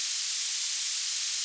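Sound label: White noise, roland mc-303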